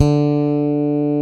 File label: TITE BS D4, TITE BASS; Drums In Your FACE!